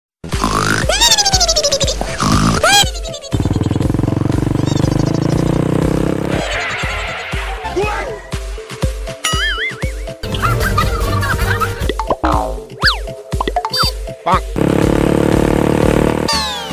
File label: new funny ringtone